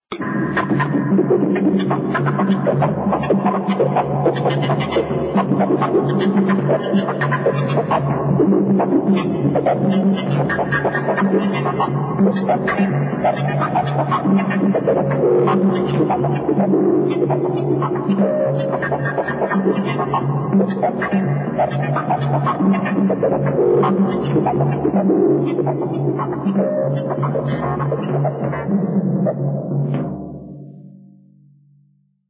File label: metamorphosis organ freakout